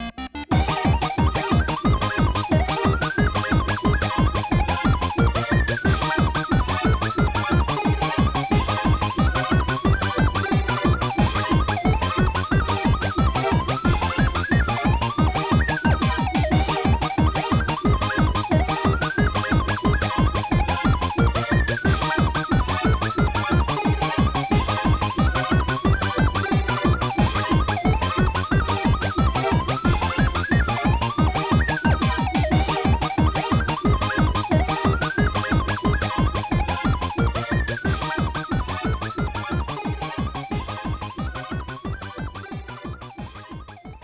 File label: Balloon Game, High Up (Fast)